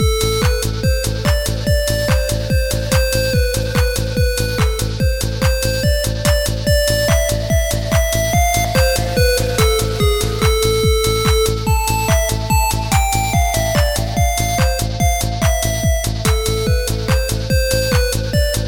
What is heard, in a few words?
melody loop; Messing around in LMMS.